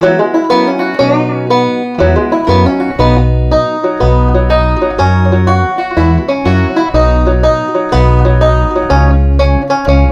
Instrumental

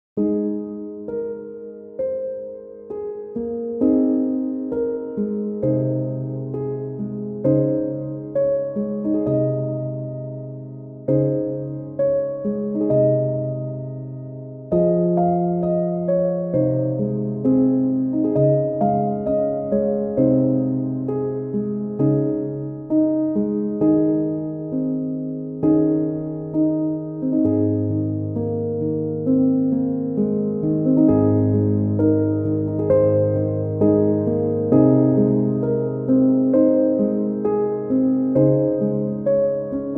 Are You Sleeping (Piano)

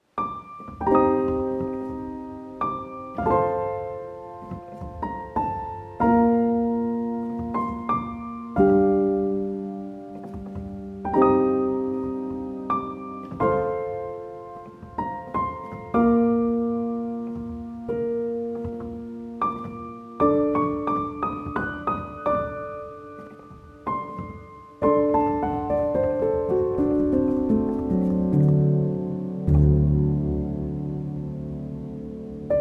Piano Version; Howl's Moving Castle

